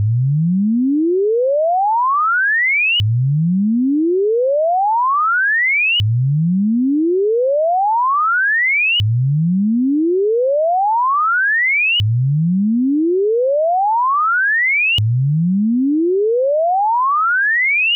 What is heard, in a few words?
vibration; rk pcba test